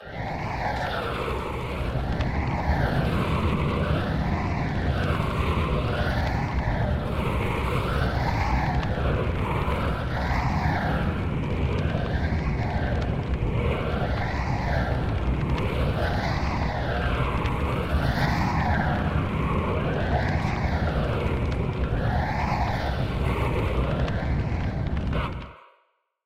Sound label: anime aura fire